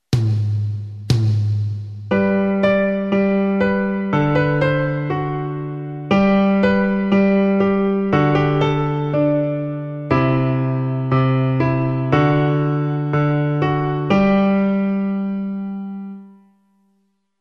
2 voces

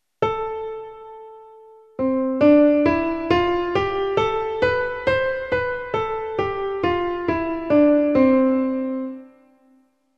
escala

